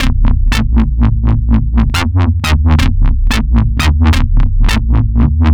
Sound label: Wowwy Bass, Drum n Bass; KJ Sawka; Drum n Bass & Beyond